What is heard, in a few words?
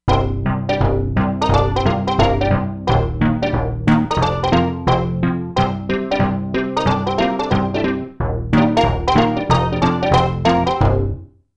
Room Res, Korg MSC-07S Synth combination; Korg MSC-07S Synth 2